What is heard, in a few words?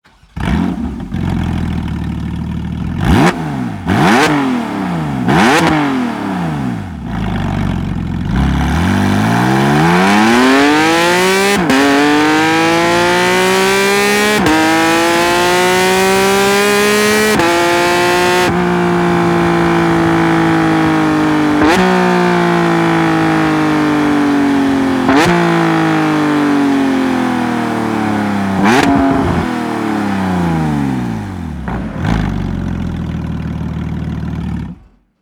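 Evolution Line Porsche 911 GT3 992.2, exhaust; akrapovic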